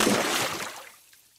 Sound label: Splash